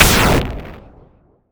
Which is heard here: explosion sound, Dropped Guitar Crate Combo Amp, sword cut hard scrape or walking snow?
explosion sound